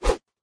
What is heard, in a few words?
start boost